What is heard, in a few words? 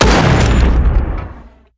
explosion; IdleRPG2024